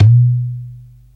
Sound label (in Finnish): Turkkilasirumpu Boing